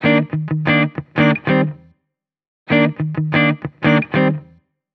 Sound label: Electric Chord Loop 180 BPM Eb Maj; Cymatics